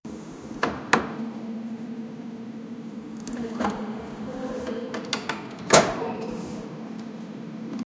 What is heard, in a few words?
portal noise; rick morty